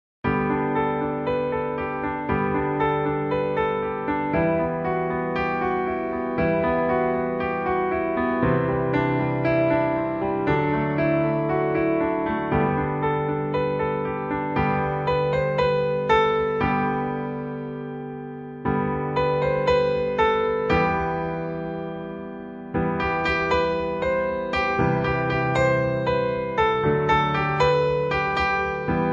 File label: Easy Piano